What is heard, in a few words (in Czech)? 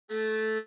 viola